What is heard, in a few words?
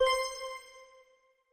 level up